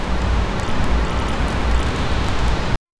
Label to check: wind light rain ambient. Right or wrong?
Right